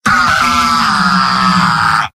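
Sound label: demoman pain critical death; mvm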